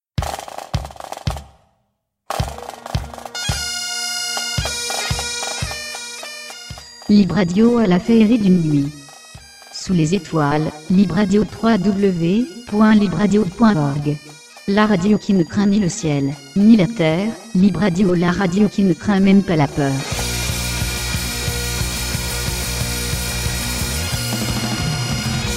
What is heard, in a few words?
jingle feerie